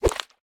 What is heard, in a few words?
Enemy Boss Punch